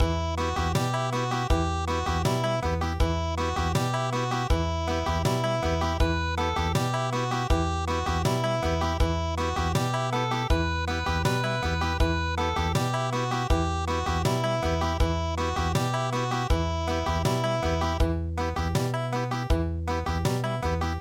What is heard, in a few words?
canon Country; traditional